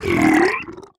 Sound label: Toad Death